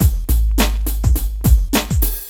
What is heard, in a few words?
PHATT BEA, Hip Hop